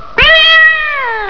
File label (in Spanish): miau